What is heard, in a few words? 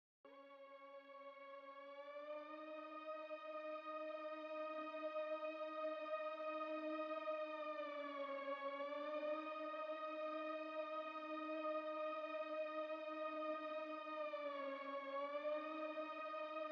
key